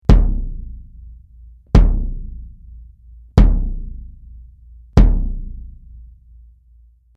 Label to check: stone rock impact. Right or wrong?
Wrong